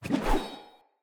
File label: Sphere Polearm Heavy Windup Fill Whoosh; Weapons; Player